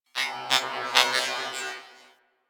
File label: Beep Comby; Warframe; Ambience; Corpus; Beeps